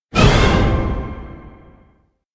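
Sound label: electronic decide jaaaan; anemoi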